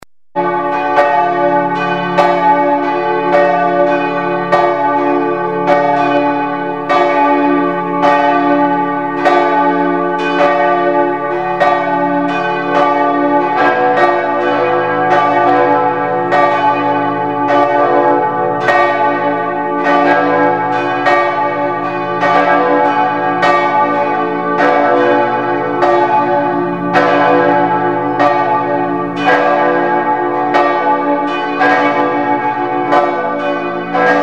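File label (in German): Vollgeläut; St Magni BS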